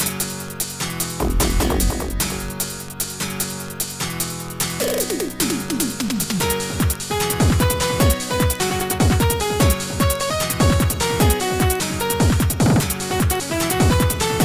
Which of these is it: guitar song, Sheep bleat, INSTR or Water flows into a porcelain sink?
guitar song